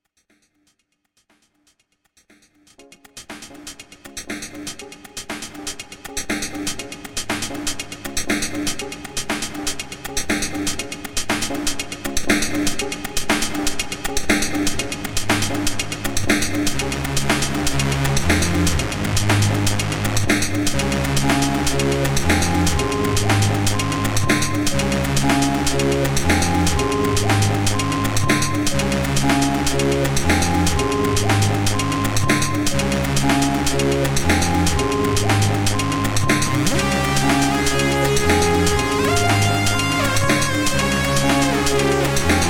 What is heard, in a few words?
Techno Train